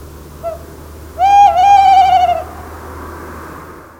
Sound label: tawny owl